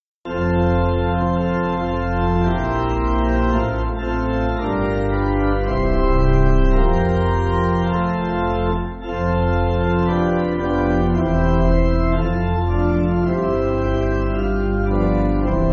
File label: Pipe, church music; Lead Thou Me On; Willingham